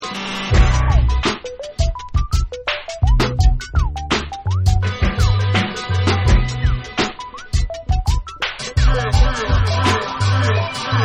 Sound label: Instrumental